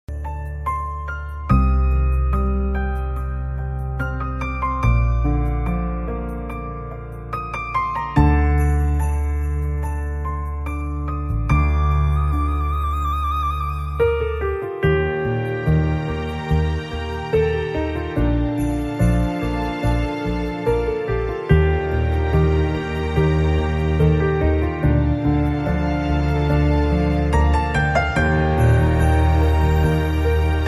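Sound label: Piano Music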